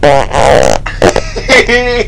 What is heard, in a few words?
fart